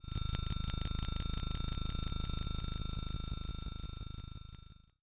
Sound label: Science Fiction Sci-Fi Electronic Ringing